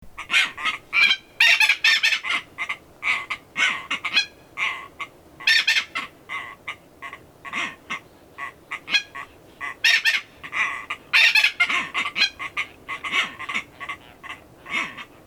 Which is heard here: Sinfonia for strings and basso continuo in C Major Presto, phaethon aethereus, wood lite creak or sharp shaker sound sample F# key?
phaethon aethereus